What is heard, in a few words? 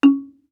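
Marimba deadstroke fortissimo Db4; MIS Pitches - 2014; Percussion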